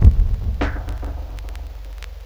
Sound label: drbreak, drumbreaks; Masterbits; Vinyl Attack